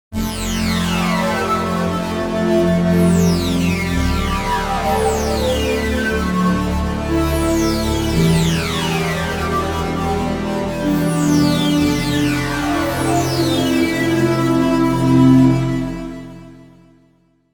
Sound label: Dist Pad